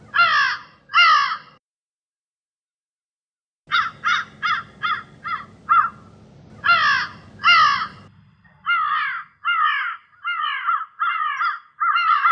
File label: Crows